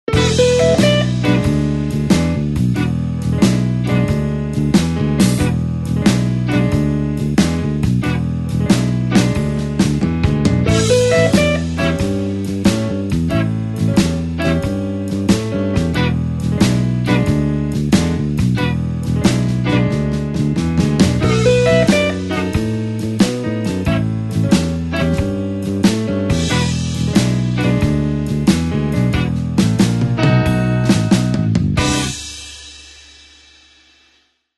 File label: blues shuffle solo E call